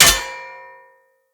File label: Short Sword Medium Impact Metal